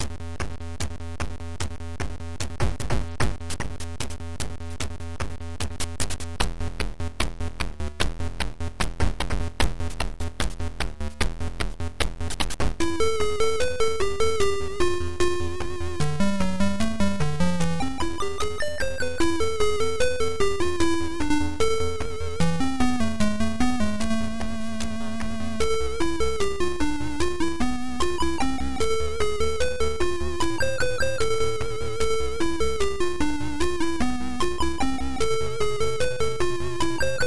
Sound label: wagon, chiptune